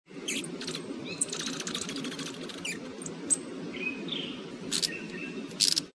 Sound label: Lampornis viridipallens